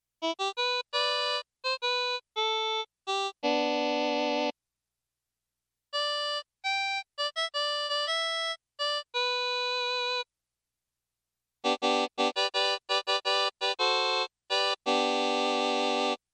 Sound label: harp